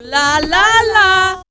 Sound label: VB MEL, Voice Spectral